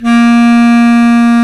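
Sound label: Bass Clarinet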